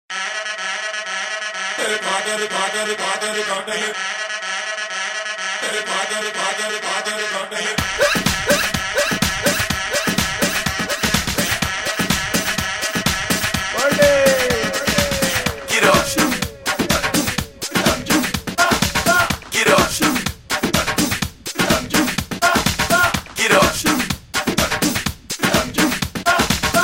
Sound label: Quarter Club Mix